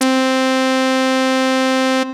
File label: lead, synths